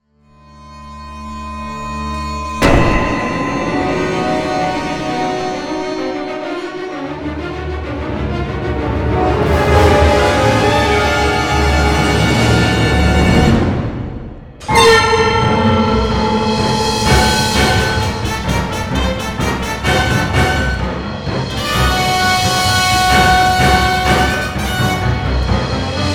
Ghostly activity, Tension 공포

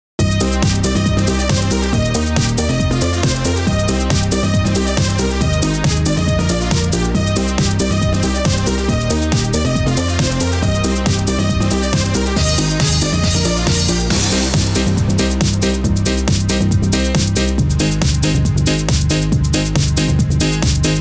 DanceTime, POP

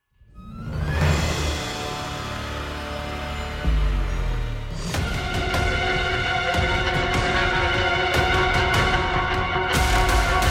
Monstrous creature, Tension

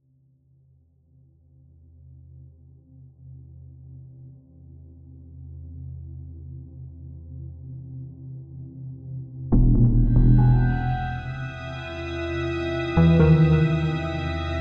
Last Strike, Tension

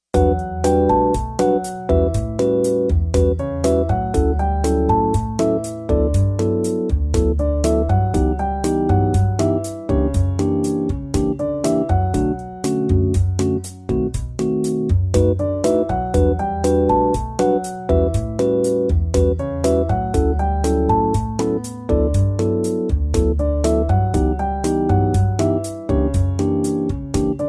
Bossanovaa

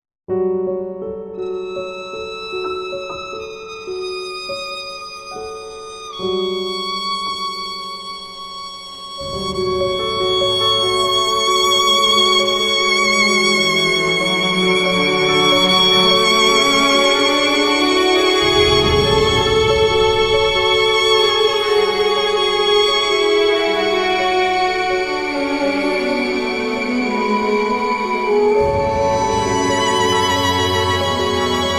Dance Orch

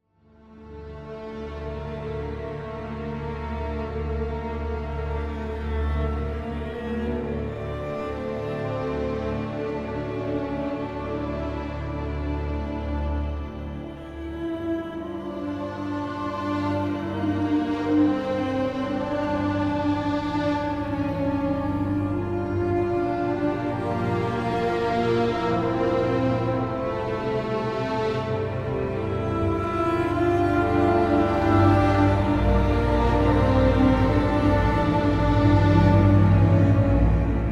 A Heartbreaking Feeling; 감성 BG

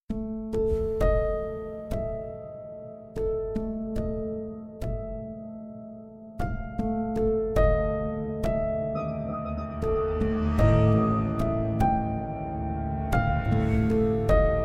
Angry Man, Tension Slow

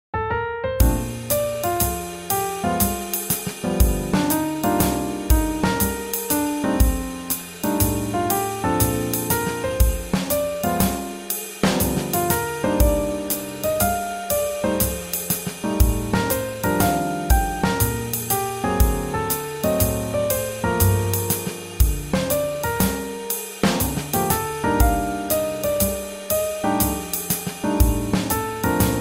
Swing Jazz

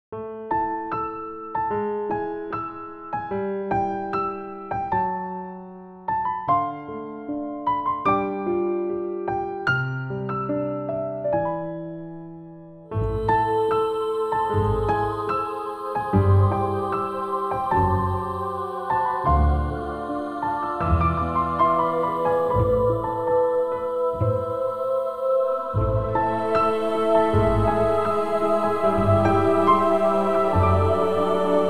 Sad Music

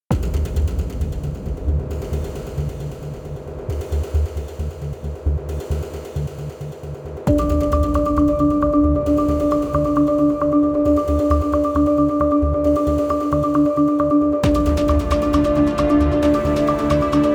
Emergency, Tension

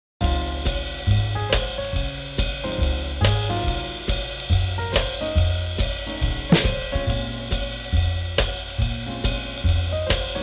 Swing Jazz

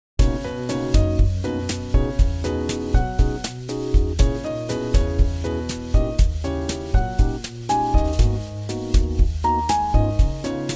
Bossanova

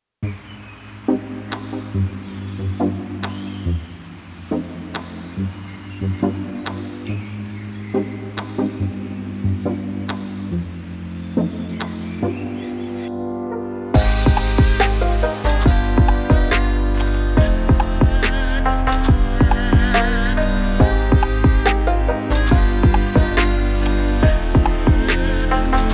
Pluck

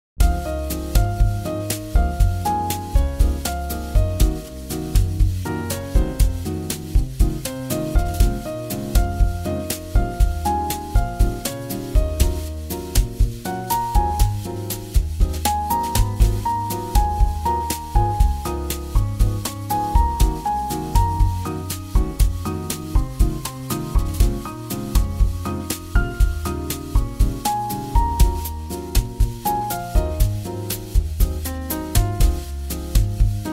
Bossanova

